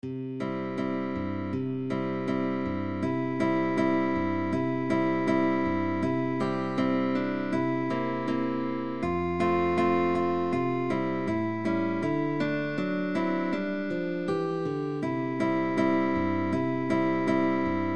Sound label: JINGLE BELLS, guitarra